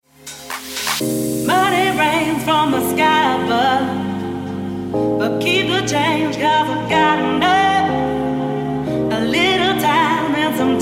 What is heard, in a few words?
e sax